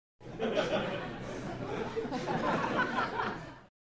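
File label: Crowd 100 Sparse Burst, medium interior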